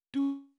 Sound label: move, game puzzle; lazpaint